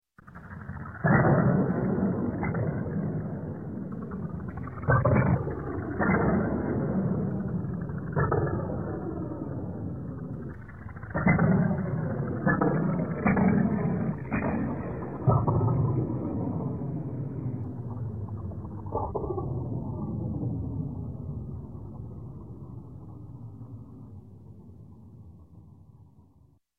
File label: Space Space Explosions, Slow, Several Blasts; Electronic; Sound Ideas 2000 Series